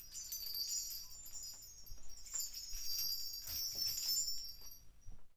BELLTREE

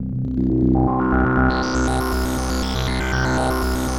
Synth Ultra Bass; Sonic Foundry (Sony Creative Software); Modular Electronica; Basses

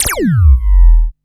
ZAP, ZAPS THUMPS; Best Service Alpha Dance vol.2

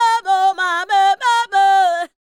Female Vocal 100bpm G Dry, African Voices

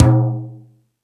Rap Session, Drum Machines E-MU Pro-Cussion

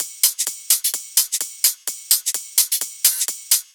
Loops 128BPM, Drumloops

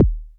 Kicks, Elektron MachineDrum SPS1 MKII

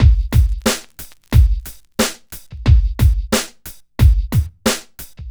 Club Tip, R&B Drums

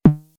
snare, DL-909

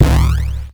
Crushed Perc, bitcrushed; musicradar

